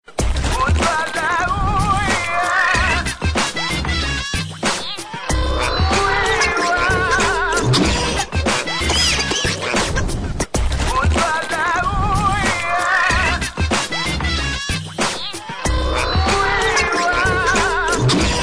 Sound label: Adv Tune; Bisleri